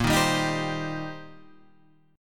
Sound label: C Minor 7th Bb